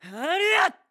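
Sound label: Sword Spin, voice; Link; BOTW; Breath of the Wild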